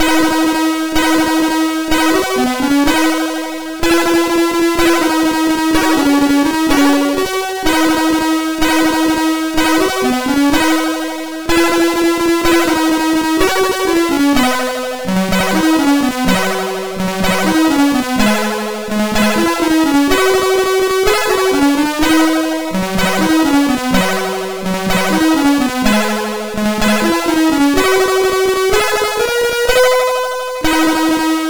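Official Father Christmas Game, MOS 6581 SID chip music, games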